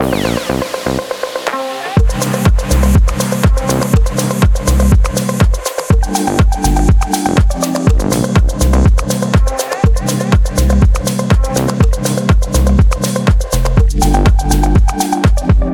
chill lounge vibes loop

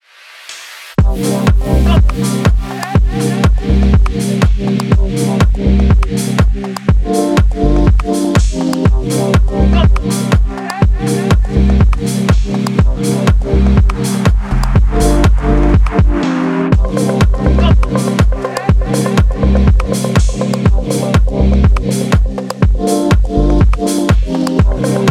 chill lounge vibes

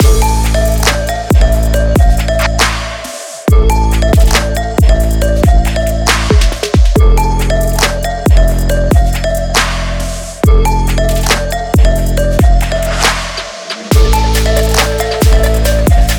dynamic sport action trap loop

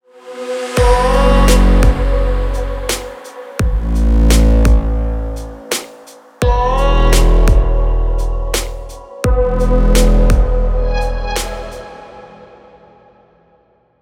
stylish beauty lifestyle downtempo

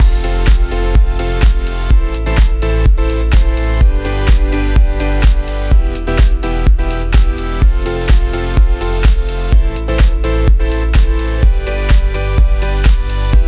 upbeat business corporate dance loop

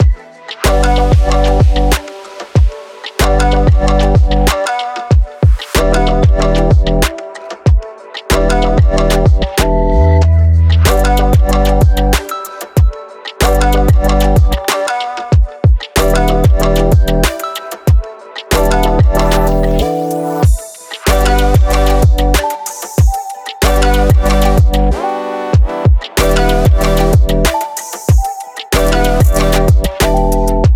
summer jam loop

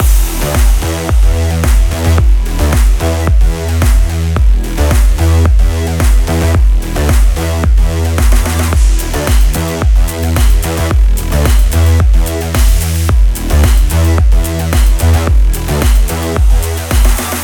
drive sport

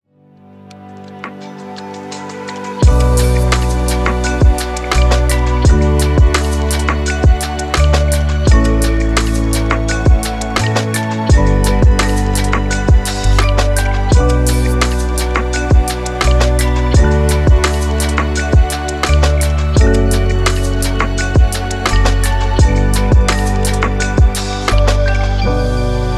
inspirational travel timelapse

